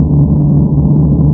Indus; Revista CD Expert 50

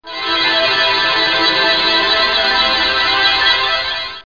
Tweedle; Total Distortion

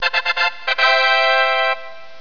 bonus

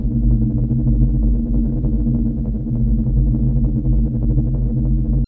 Ship; Far Gate

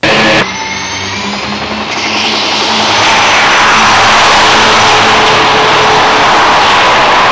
warfare, cartoon sfx

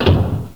door slam; drums; gmplay

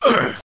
pain; TNP demo

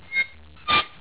Metal

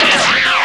spin; robotron

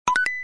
hit Mice Sound; habilidade

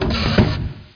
air button; buttons; Quake; PC Gamer 51

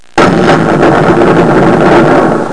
impact

